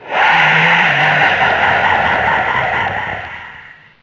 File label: attack, evoskeleton; custom monsters